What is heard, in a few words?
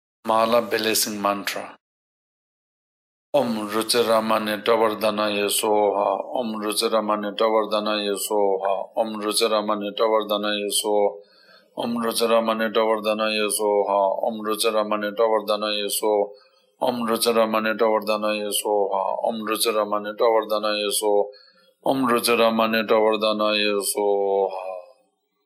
mala blessing mantra